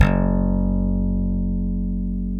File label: E Bass Slap